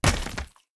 pillar Hit; unsighted

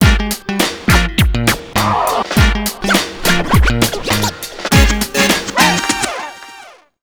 Match Lost; Mike Tyson Heavyweight Boxing; PS2; Xbox

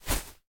Footstep Beanbag; viewfinder; xbox series xs

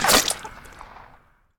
Water Splasher; Rabbids Multiverse

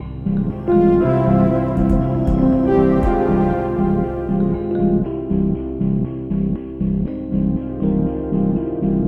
Suit Up 4 Bar, 1 2 Note Pickup 2 Bar Loop 119 Bpm; Suit Up C Mask 4 Bar, 1 2 Note Pickup 2 Bar Loop 119 Bpm; gamerip

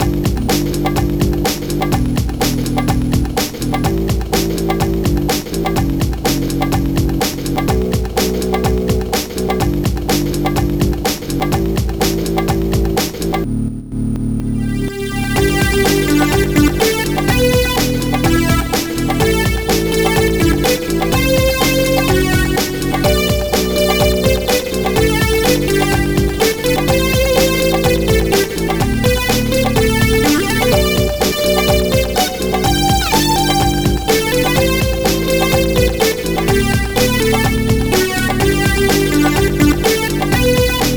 Congrats; Minskies Furballs; Amiga

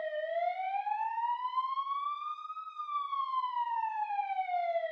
Amb Red-Corridor Siren Loop

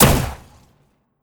Plasma Shoot

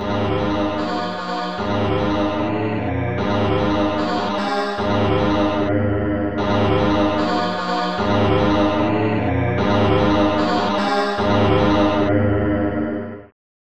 Fanfares; Dragons Breath; Amiga

